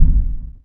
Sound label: Door Boom; NightFall